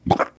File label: Panel Toilet Plunge, sound effects; Among Us